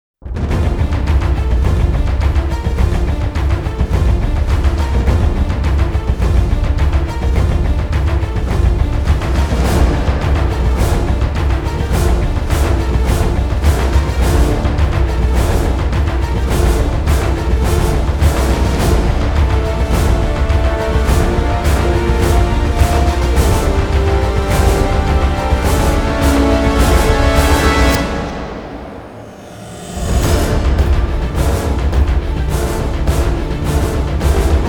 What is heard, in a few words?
continued existence instrumental